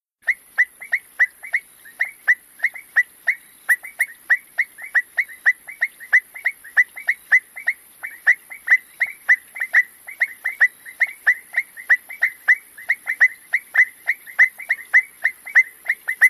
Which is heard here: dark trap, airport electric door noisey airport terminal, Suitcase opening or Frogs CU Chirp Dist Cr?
Frogs CU Chirp Dist Cr